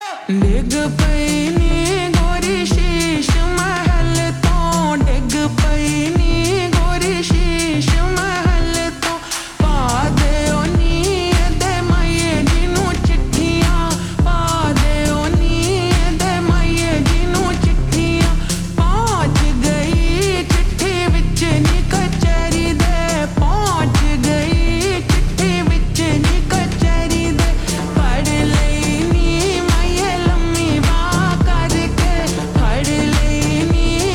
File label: Slowed Reverb Ringtone